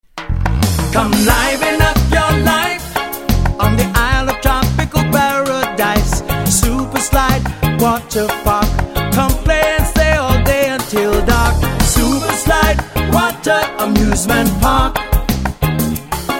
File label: jingle; WATER AMUSEMENT PARK; jingle samples